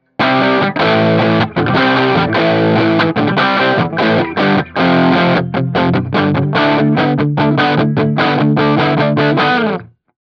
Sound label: rock; ampvergleich